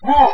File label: jump